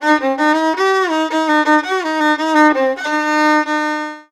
VIOLON, Arabian Traditions; USB Soundscan vol.43; AKAI

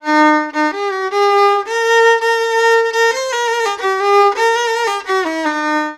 VIOLON, Arabian Traditions; USB Soundscan vol.43; AKAI

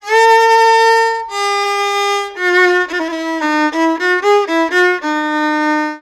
VIOLON, ArabianTraditions; USB Soundscan vol.43; AKAI

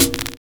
LA CLOCHE; Univers Sons

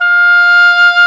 HAUTBOIS; East West; Global Sounds

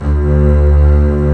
ARCO BASSE; E-MU Formula 4000 Series Vol. 5; Protozoa; Proteus2 Instrmt

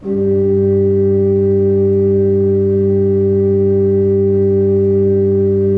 Orgue Eglise Doux G3, Pipe Organ Soft

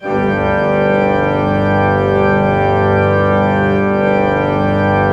Orgue Eglise Full G2, Pipe Organ Full

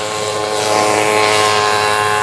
mob passe, MOTOS; East West - Global Sounds

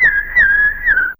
Oiseau; Fairlight CMI IIe

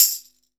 TAMBOURIN; Univers Sons; Basicussions

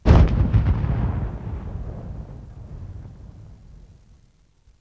TANK TIRE, REVOLVER; East West - Global Sounds

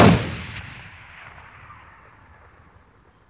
BIG COUPS, REVOLVER; East West - Global Sounds

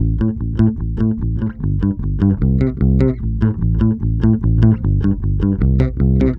BASSE; USB Soundscan vol.32; Roots Disco; AKAI

